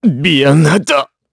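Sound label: Vox Dead, Korean; heroes; Clause ice; kingsraid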